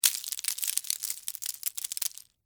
Texture, Debris, Spill, Sugar, Cone, Waffle; Debris Sound Effects

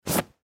Cloth Smooth Sheet; Cloth Smooth Sheets and Pillow; Bedroom Sound Effects; Household; Foley; Blastwave

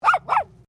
Animal Dog Poodle Miniature Bark

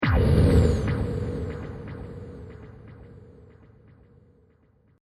SciFi Explosion With Delays

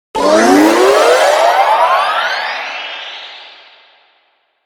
Ascend Warble Laser Delay; Ascend Harsh Warp Build Up Production Element Imaging Element Accent Transition

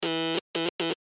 Morse Code D Letter; Blastwave

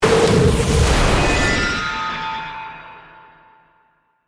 Hit Complex Distort

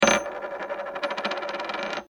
Ring Drop Foley Spin; Drops; Objects; BBFX

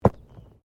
Body movement Fall Medium

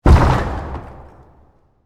Hockey Check, Body, Check, Into, Boards; Sports; BlastWave

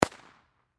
Explosion Firecracker Single Distant; Fireworks; Backgrounds

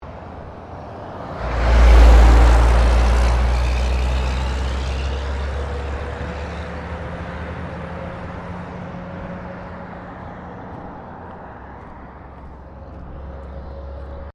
Traffic Semi Trailer Pass By; General traffic sounds; Traffic and Cars

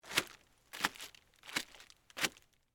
Plastic Tarp Walk, Footsteps; Human

